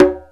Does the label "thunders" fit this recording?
No